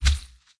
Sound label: movement step; smite; god sobek